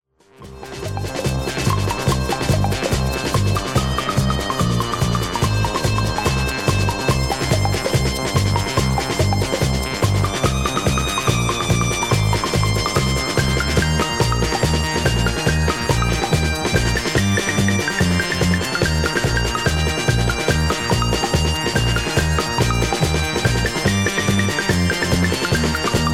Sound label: galaxy sound orchestra